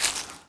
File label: Small step, Dino